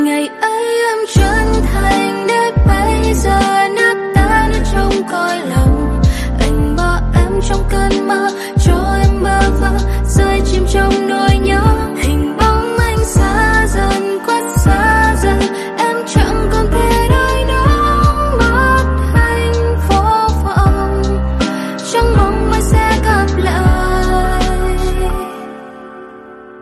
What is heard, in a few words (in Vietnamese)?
Nhac Chuong Lofi Ver